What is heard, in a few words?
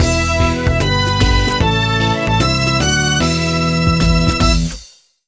Pirate Coin Obtained; Galactic Jungle [Jungle Level]